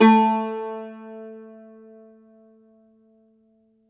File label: Cumbus SAZ A, Plucked Strings; E-MU Producer Series Vol. 7 – Old World Instruments